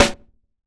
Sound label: SD HIP; Best Service; Dance Mega Drums